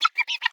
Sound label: Guineafowl